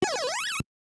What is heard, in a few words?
Hit Enemy; Galaxian